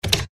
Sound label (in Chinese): 打字机啪嗒音